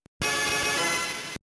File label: Player Move; Chessmaster; GB